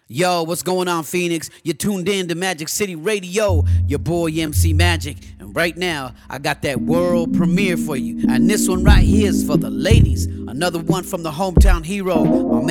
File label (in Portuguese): quadrilha rap